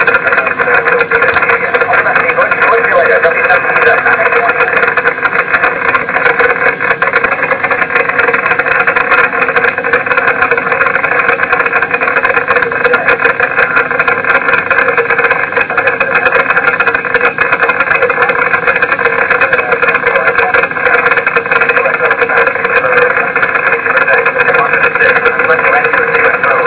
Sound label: 8bits mono